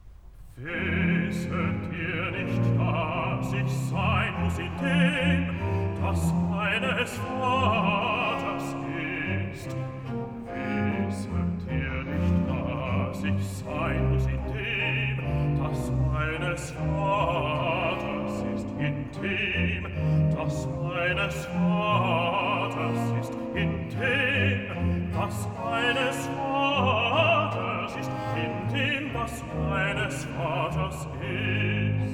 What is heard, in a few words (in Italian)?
Arioso (Bass); Wisset ihr nicht; Bach; Cantatas; Mein Liebster Jesus ist verloren